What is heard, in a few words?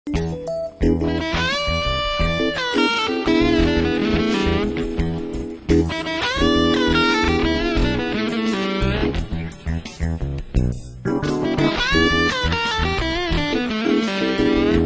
blues